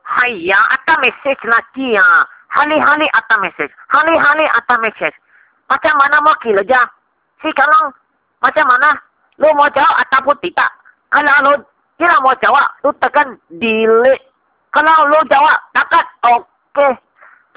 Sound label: Sms Chinese